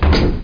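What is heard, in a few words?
Elevator Door Close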